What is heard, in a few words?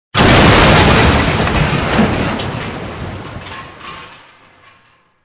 crash; Soldier Of Fortune II Double Helix; XBOX